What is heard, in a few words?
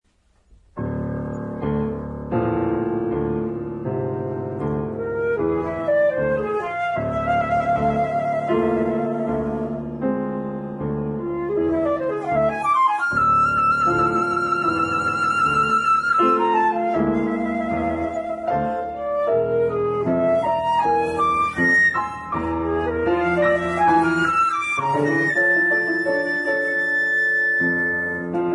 Flute Sonata